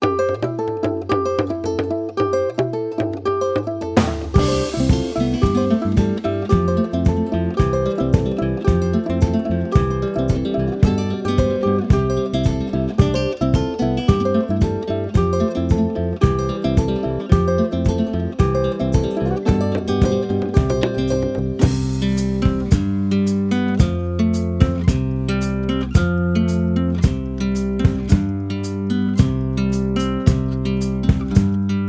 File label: karaoke; Ayelevi